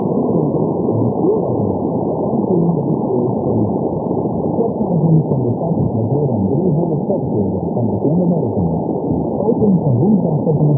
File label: 1575 kHz loop; NL